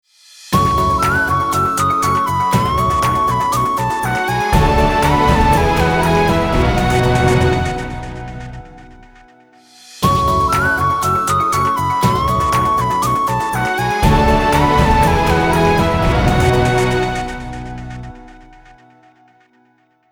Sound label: Tune; Ziox; Zi4004